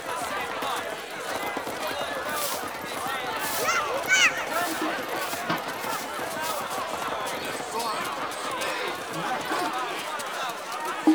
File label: Ambient Loop, Village Exterior; Warframe; Eidolon Plains; Ostron Village; Ambience